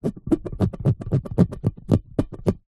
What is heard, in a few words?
sport netball ball rub scratch mark off finger; zapsplat